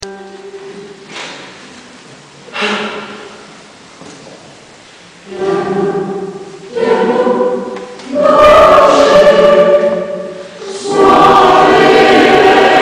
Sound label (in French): choeur, chant choral; salade italienne; Richard Genee; choeur universite du maine; concert